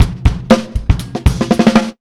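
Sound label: break, Electro 125, Big Beat Drumloops; USB Soundscan vol.25; AKAI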